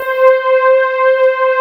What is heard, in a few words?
MALLETS, JV 1080; Pro-Rec - Synthsonic